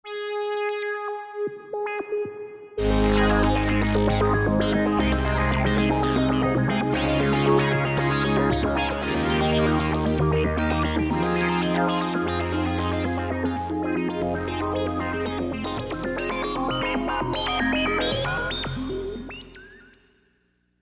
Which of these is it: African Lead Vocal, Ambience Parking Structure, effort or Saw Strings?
Saw Strings